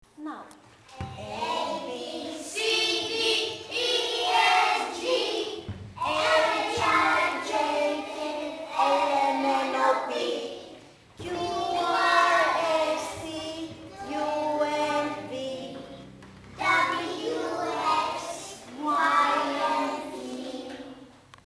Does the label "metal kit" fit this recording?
No